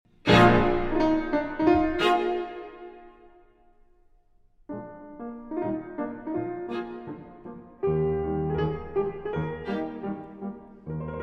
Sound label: Piano Trio in B-Flat Major IV. Allegro Moderato. Presto; Op. 97; Archduke